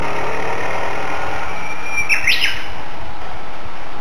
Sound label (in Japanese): uguisu